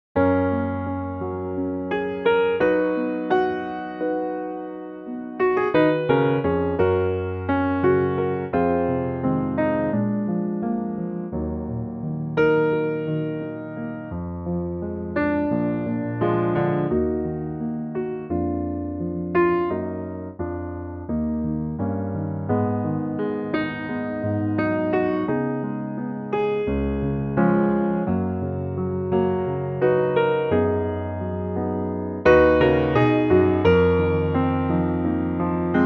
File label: Gb piano; key - Gb - vocal range - Gb to Bb